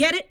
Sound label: VOX, VOCALS; Ueberschall; Jam Box